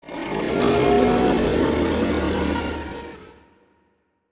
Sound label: kaiju hatchling rage roar; biogenesis